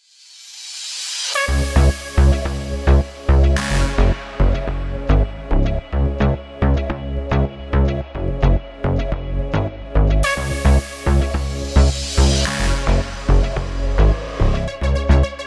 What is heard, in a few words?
deep house; by burak sound bank; deep house vol 8